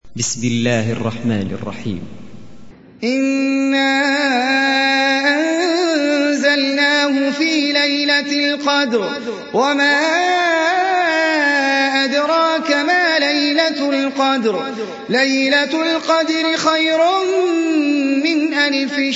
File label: Al Qadr retsitatsioon